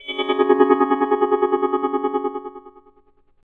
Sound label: CHORD, Drum & Bass, 130 BPM